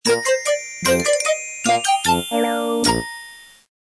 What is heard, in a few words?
game over